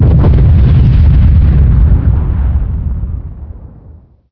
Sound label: explosions; ambient; source engine; hl2; video games